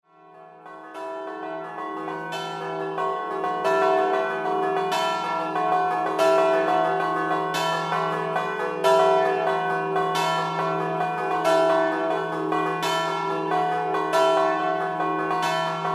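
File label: bell ringing; Clauiano; campane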